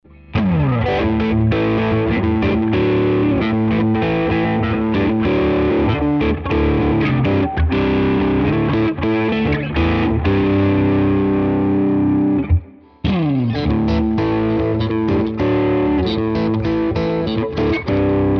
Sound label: AZ2207F crunch all positions; AZrunch 2